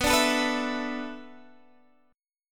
B Minor 11th B down, Bm11 chord; strummed

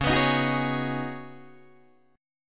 Db 9th Suspended 4th Db Guitar Open D

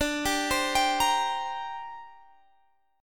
D 7th Suspended 4th Sharp 5th, down; D7sus4#5; arpeggiated

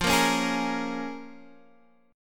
Bb Minor 7th, guitar

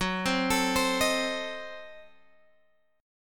F sharp Diminished 7th F sharp Guitar Open F; F#dim7 arpeggiated; 18,19,21,19,18,x

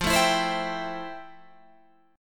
F Major 9th F, Guitar, Open G

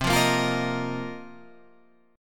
A sharp Suspended 2nd Suspended 4th C

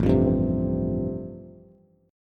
A-Minor 7th Sharp 5th, Bass, chord; 5,8,5,5; Am7#5 strummed; JGuitar

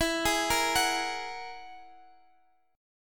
G sharp 7th Suspended 2nd Sharp 5th

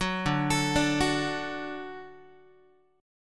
D Major D down Guitar

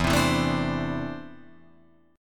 E 7th Suspended 4th Sharp 5th, Guitar, Open F; E7sus4#5 strummed; JGuitar

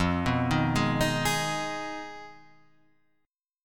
F Minor 7th Flat 5th F down Guitar Half Step Down; Fm7b5

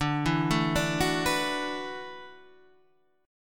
E 9th Suspended 4th D, guitar

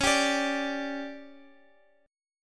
D Major 7th Suspended 4th, chord

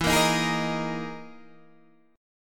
E Major 7th Flat 5th chord

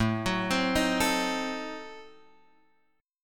A Diminished 7th A, chord shape; Adim7 Chord {5 X 4 5 4 5} arpeggiated

